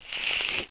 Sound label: CRUMPLE